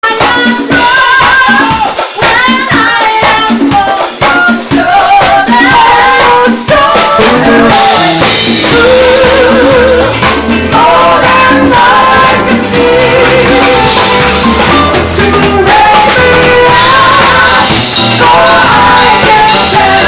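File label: Fender P Bass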